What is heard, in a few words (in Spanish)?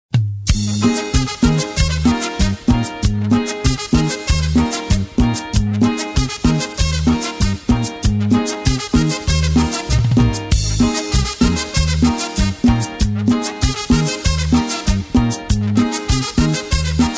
cumbia